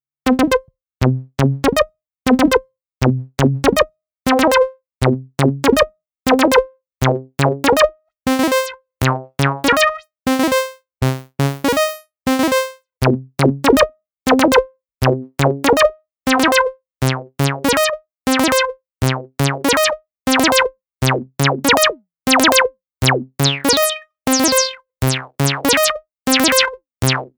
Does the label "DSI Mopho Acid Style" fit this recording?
Yes